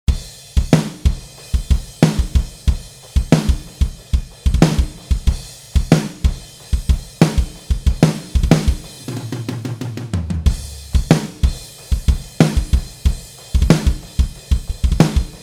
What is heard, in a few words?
drums samples and rooms